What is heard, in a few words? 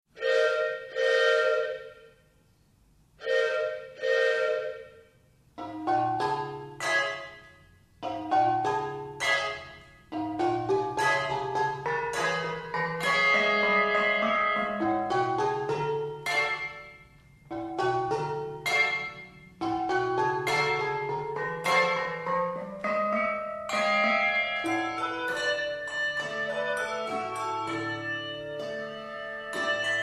habanera